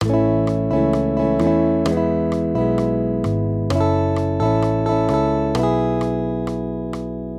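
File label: Guitar, Accompaniment, Major Triads, A-E, 130 BPM; maj5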